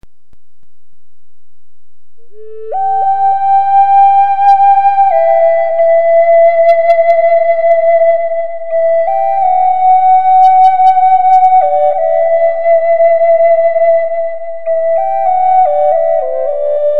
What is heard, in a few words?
Am, Native American Flutes